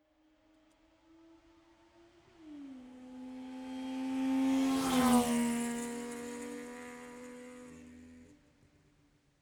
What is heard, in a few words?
car saab 92 exterior pass right to left